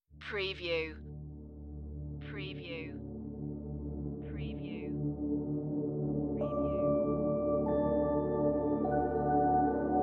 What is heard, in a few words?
evil music box